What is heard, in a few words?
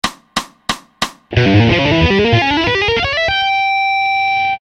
Diminished Scale Taps fast